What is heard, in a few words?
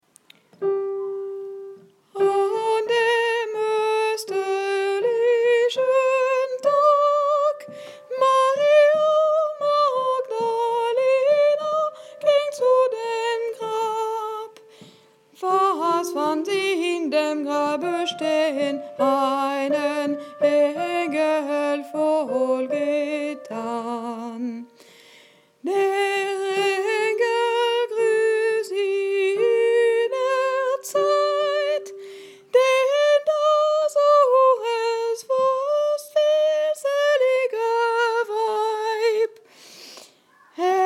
magdalena tenor